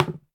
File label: Cone Stn Hit; moul-assets